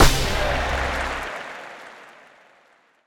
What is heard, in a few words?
book open; HeavenStudioPlus; cheer Readers